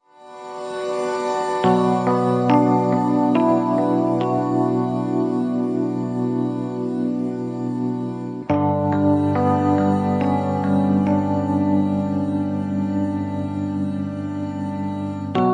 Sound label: No Perc